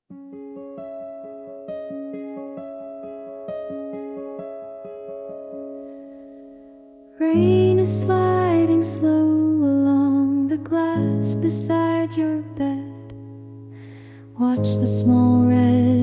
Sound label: lullaby, name songs; river